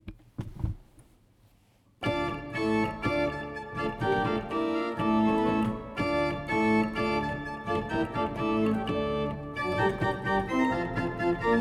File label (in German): Walcker 6 I P, orgel